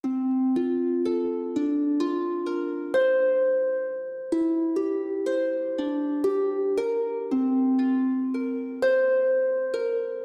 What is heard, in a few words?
sing rite accompaniment ending; shakra; Hollow Knight: Silksong